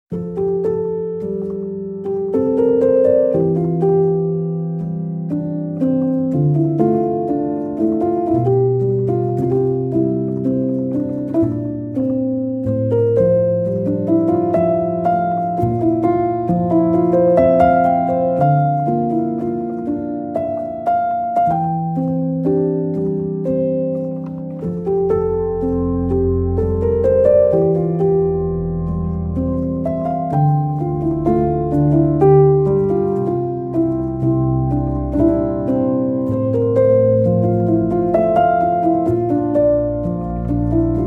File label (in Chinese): 钢琴; Komplete